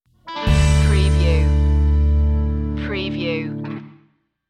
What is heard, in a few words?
lonesome ranger stinger